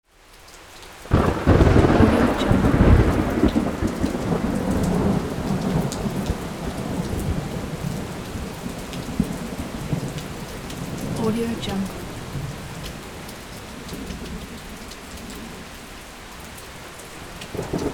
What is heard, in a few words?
thunder